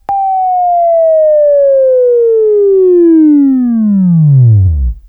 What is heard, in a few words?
WAV Left, Sweeping; Sweeping Training program